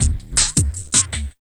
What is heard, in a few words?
Loop, Drum & Bass, 160 BPM